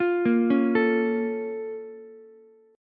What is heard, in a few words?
A sharp Minor Major 7th, down, Ukulele; A#mM7 arpeggiated; JGuitar